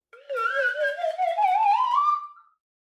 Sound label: Slide Whistle Wobble Up; SoundsCrate